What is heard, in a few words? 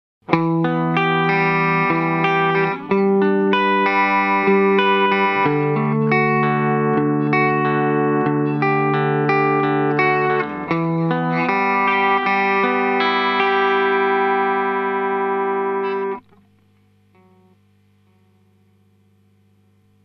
Compressor Plus Tone Pot Variation; Keeley